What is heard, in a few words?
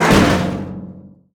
explosive objects; World of Tanks